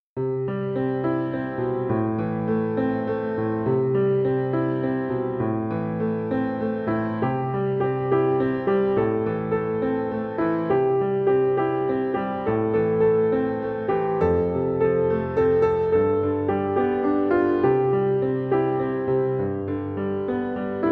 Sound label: Halleluia